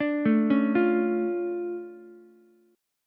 D Minor Major 7th, D, Ukulele; 7,9,9,8; DmM7 arpeggiated; JGuitar

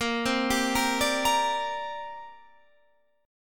D 7th Suspended 4th Sharp 5th, chord

